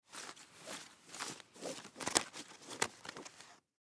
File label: Cards Pick Up Multiple Poker Table; SPORTS; Cards (Sound Effects:Casinos)